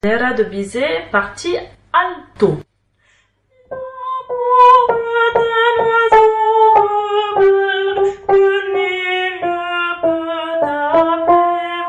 Alto; Habanera; Bizet

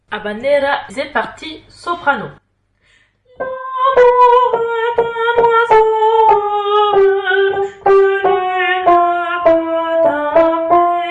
Soprano; Habanera; Bizet

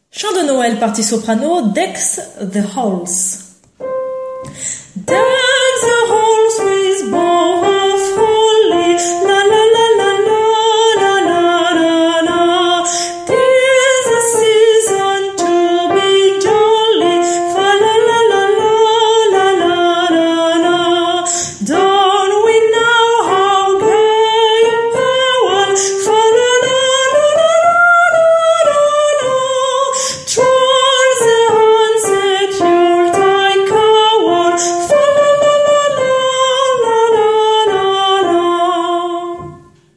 Deck The Halls Traditional Soprano

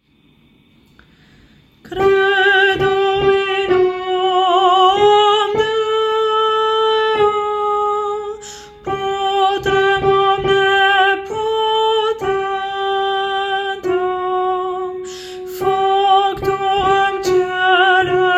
alto; Credo 1 ère page

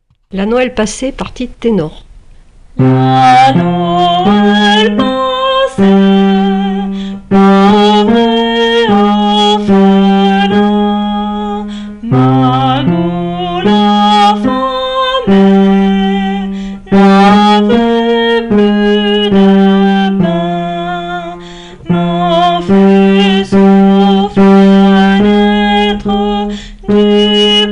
Tenor; La Noel Passee

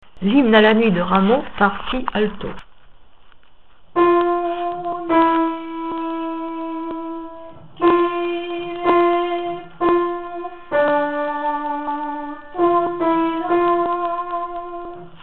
Alto; Hymne A La Nuit; Rameau

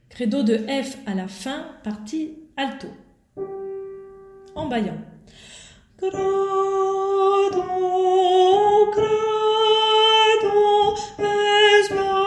alto; Credo f à la fin

